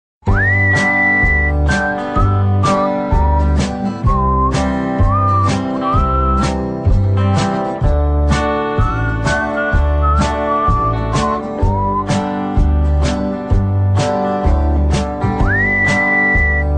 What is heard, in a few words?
Whistling Leisurely